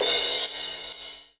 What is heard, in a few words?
Crash; Equipped Music; Loungin House